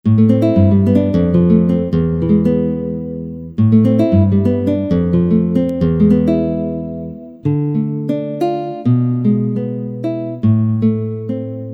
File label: Nylon Gtr